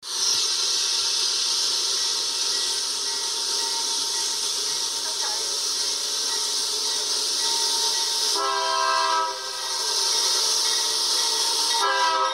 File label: BNSF Dash 9-44CW